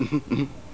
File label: Emhmemhm